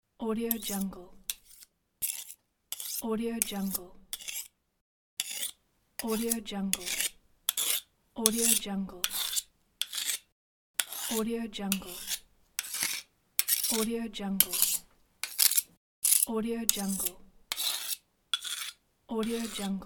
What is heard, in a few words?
knife sharpening